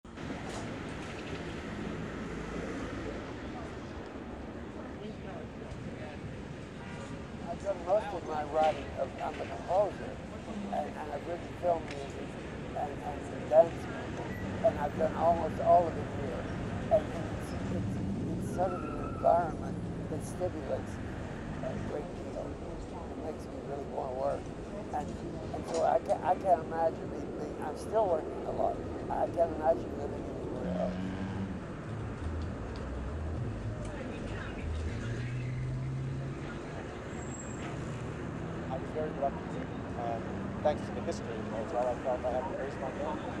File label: soundscape; project 2 soundscape mixdown; 4d-soundscapes